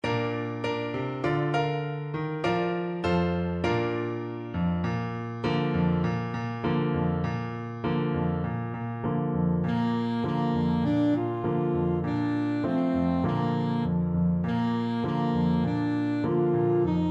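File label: alto saxophone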